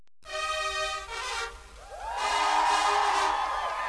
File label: trumpet